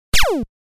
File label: Arcade 8 bit shot laser gun